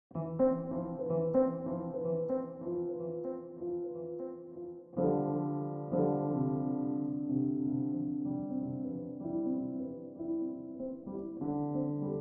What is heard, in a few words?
Piano; Novel Piano